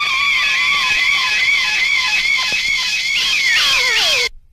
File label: p.e. guitar twiddle